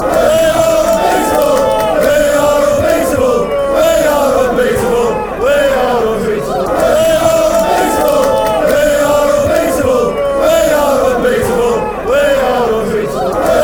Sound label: we are unbeatable, fanchants